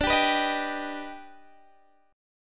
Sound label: D sharp Augmented Major 7th D, chord